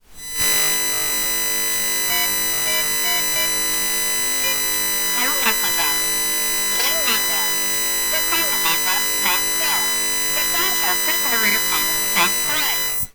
Pick up cellphone

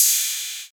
Ride; Dust808

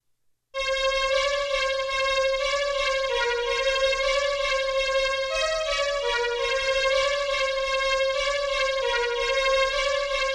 Build Up Music

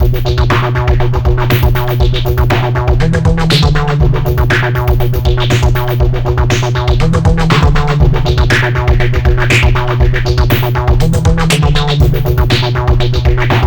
Groovy Groove

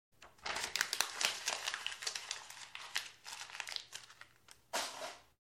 Wrinkled Paper

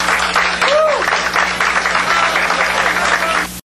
Applause

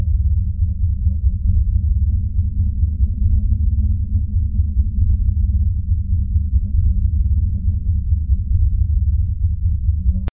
Horror room tone

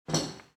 Saucer On The Table

